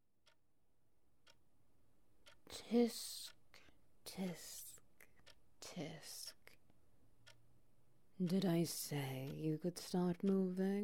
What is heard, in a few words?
Ambience